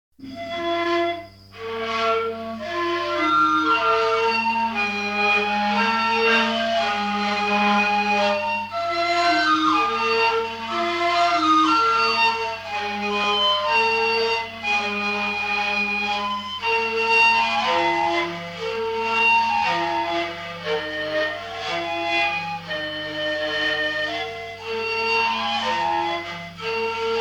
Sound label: Melanesian Panpipe; Solomon Islands; Melanesian Panpipes; Solomon Islands Broadcasting Service; Voyager Golden Record